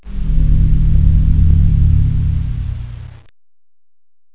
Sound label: pipes